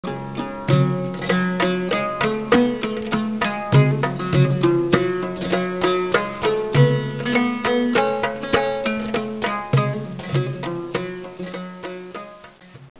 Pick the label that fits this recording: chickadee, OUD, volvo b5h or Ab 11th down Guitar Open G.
OUD